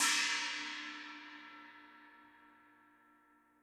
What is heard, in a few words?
china, gretsch kit